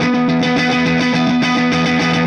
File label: Clinical Distorted Funk Guitar